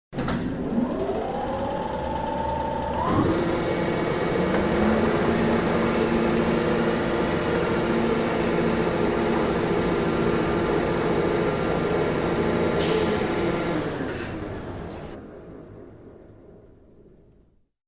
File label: brake pad